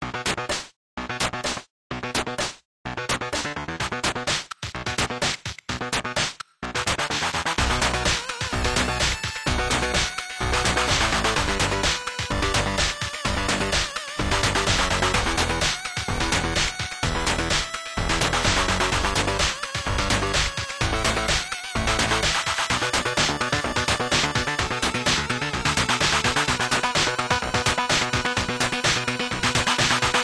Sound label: Bgm Bonus Ingame; Evil Factory; Android